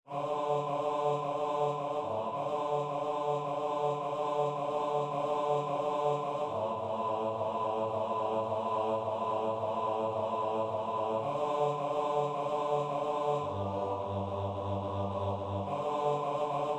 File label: bass